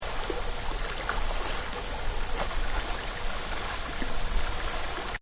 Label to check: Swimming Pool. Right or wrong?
Right